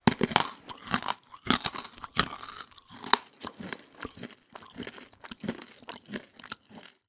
food candy throat lozenge eat crunch

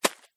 footstep single ground farm hay dirt; foley; zapsplat

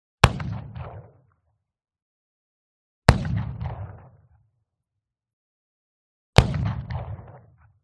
WEAPONS REVOLVER Shot 44Cal Remington 100m; SmartSound

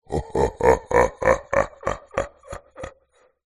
horror male low pitched evil laughter

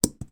foley bauble decoration christmas fall on carpet; zapsplat

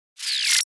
Computer SFX short; soundbits

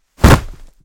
Body fall cement; foley walkers

